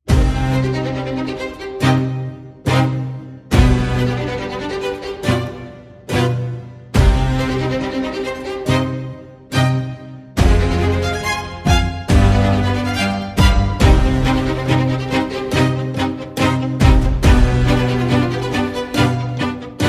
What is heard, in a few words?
No Ticking Percussion